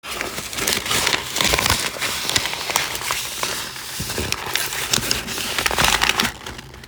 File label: paper